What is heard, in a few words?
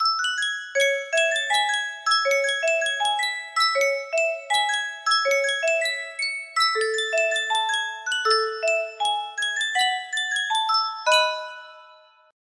Sankyo music box, music box melody